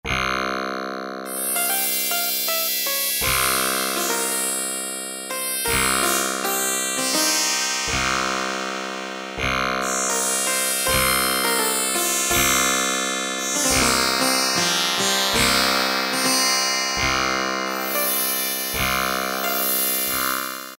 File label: Sitar, Kawai K1m; Factory patches - I banks